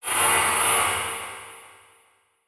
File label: Title Transition Feedback Pulse; Adobe; Production Element